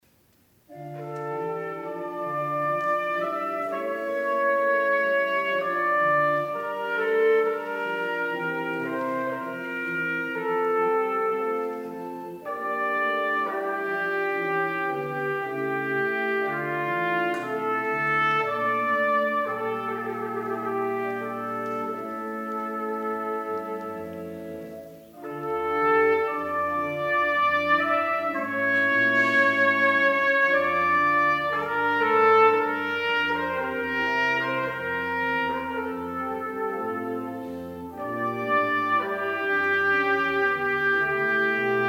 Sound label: Sarabande, trumpet; (Sonata VIII)